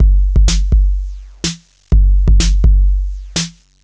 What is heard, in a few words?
drum under stripped 125, Drum Loops, Classic Chicago House